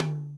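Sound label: 606 high tom; Crypton - DrumBase5000; TR-606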